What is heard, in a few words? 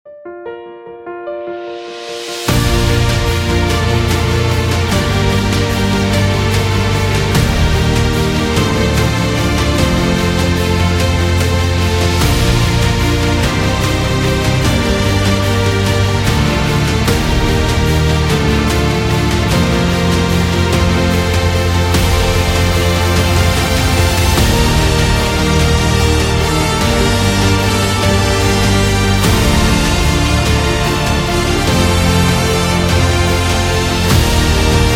Victory